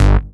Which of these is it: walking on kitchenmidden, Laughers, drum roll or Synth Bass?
Synth Bass